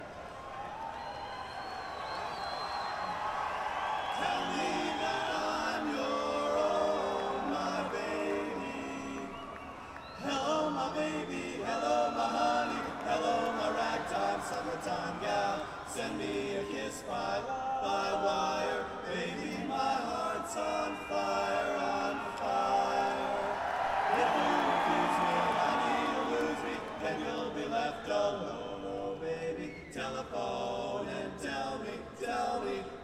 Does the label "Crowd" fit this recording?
Yes